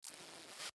Bottle Spray, Bottle Spray Single Squirt Fluid Bottle; Spraying; Foley; Blastwave